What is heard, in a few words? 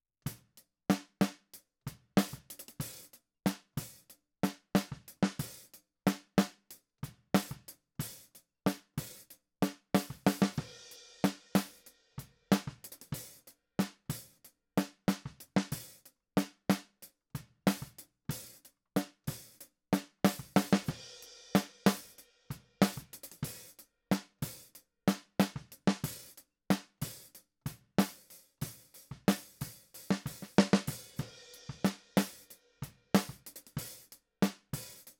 Snare